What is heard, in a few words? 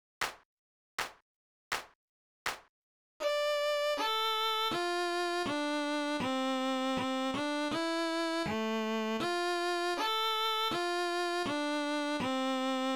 First Song Violin